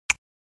Selection button interface classic

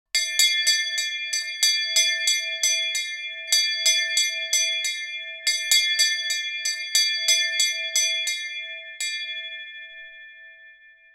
Large handbell ringing

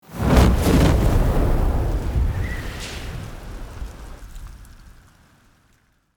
Pterodactyl dinosaur flying take off

